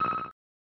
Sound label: Bonus Coin; Wrecking Crew 98